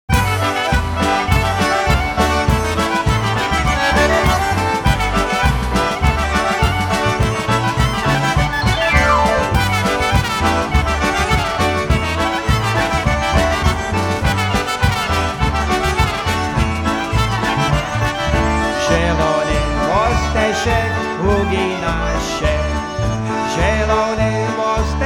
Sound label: Polka